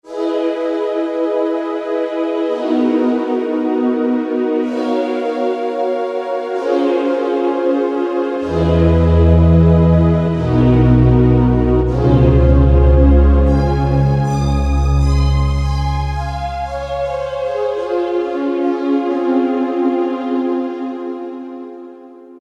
Lone Voice

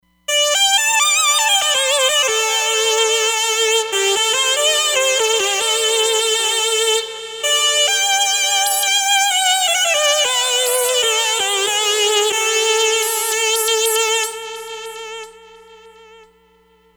Classic Lead, Roland Fantom XR

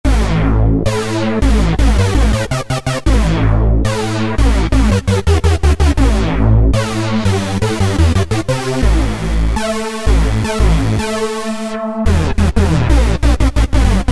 Sync Bass, Korg microKORG; Factory Voices

